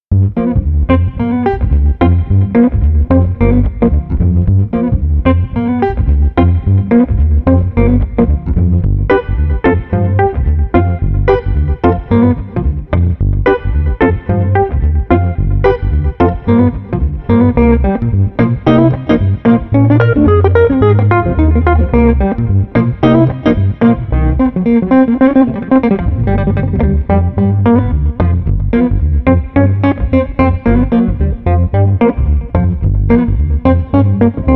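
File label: Street Guitar Bass Smooth, 110 bpm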